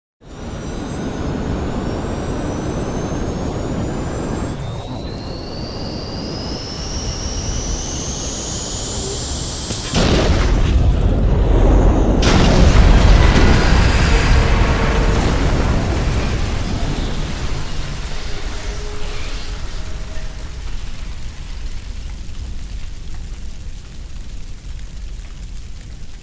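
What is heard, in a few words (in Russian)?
звук падения самолета на взлете с горением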